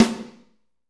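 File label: SNR, snare, Drumscapes Roland; Northstar; Fast Shuff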